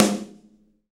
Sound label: SD Real, Roland; Sound & Vision - Gigapack I